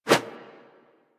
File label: Throwing Dagger Idle Light Whoosh; Warframe; Weapons